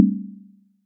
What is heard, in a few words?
Bounce Back, notifications; Nook BNTV250